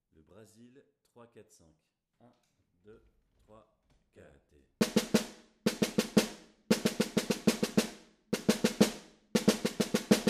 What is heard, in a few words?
Brazil 345 Snare, Batucada